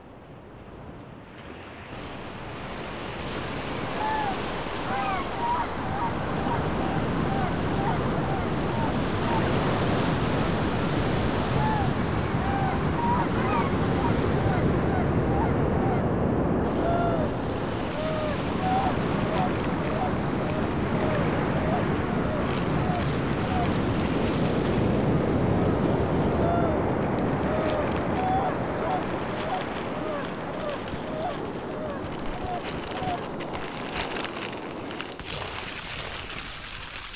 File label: concrete ocean; Modplug tracker, Audacity; by me and TFCC